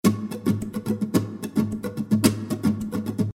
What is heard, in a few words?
Percussion